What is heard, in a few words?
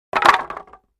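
2x4 Several Planks Dropped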